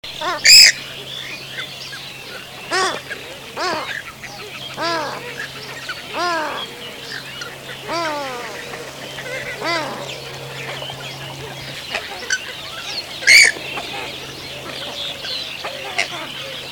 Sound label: Grey headed Swamphen Porphyrio poliocephalus